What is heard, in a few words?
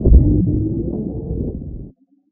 Haptic Server turn on